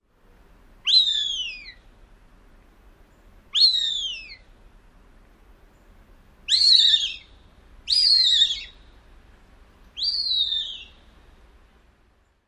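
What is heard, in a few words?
Cooper's Hawk squeaky whistles of juvenile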